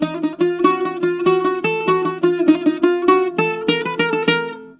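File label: D#, bouzouki; USB Soundscan vol.53; Mediteranean Traditions; AKAI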